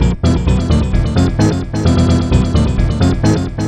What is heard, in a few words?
synth arp; Electrocution